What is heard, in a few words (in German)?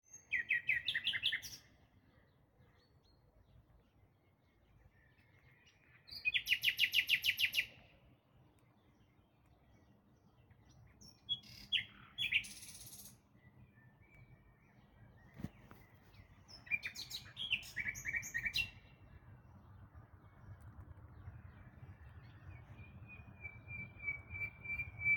Nachtigall, Gesang einer Nachtigall; Wie schön und laut eine davon sang; Der Dawn Chorus 2025 fand wie jedes Jahr im Mai statt